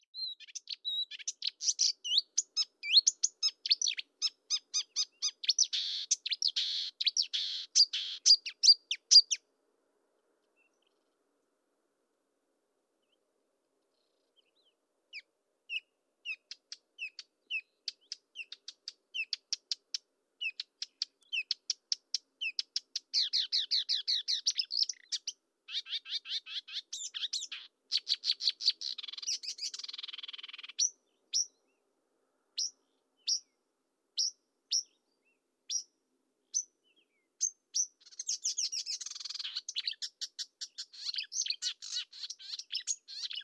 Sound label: acrocephalus palustris